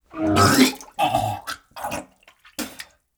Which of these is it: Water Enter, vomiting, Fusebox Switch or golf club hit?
vomiting